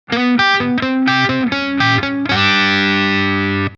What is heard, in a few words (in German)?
G7 mit Chromatik